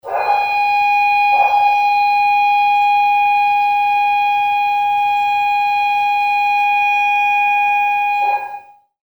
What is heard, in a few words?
burglar alarm